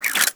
Hand Shotgun Spin, Weapons; Hand Shotgun Prime

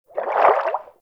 Personal Quarters Fish Tank Movement Quick; Warframe; Hub; Liset Personal Quarters

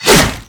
Whoosh, Weapons; Infested; Coda Caustacyst

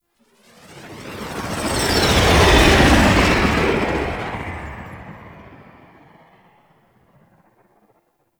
Blue Sky Liset Fly By Slow, vehicles; Warframe; Liset Flight

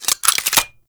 Experiment Rifle Reload; Warframe; Weapons; Corpus

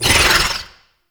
Wall Climb Cast Vocal; Warframe; Oraxia

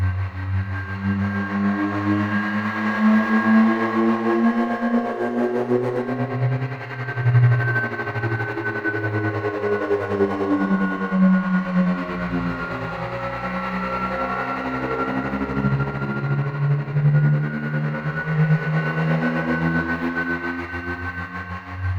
Thruster Ship Thrusters Loop; Alternate Engines

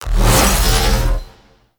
Fire Charge; Weave Boss

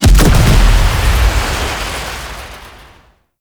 Red Barrel Explosion Group; Gameplay; Awakening Remaster

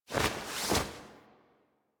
Idle Screen Foley Brush; Warframe; Corpus; Corpus Lich

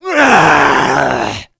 Mariner Zombie Attack, Vocals

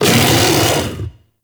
Kubrow Armored Vocal Attack Medium; Warframe

